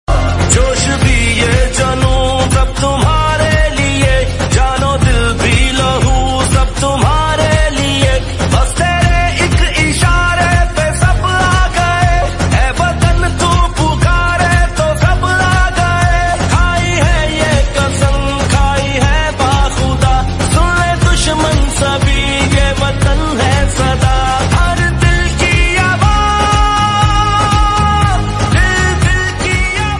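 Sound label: patriotic Ringtone; Desh Bhakti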